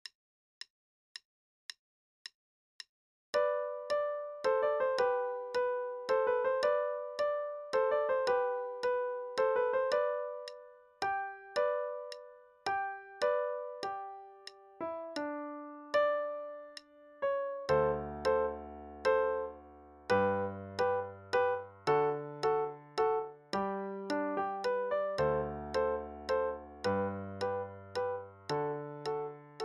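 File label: Play-along, Minuet, quarter note=110; Paderewski - Minuet in Olden Style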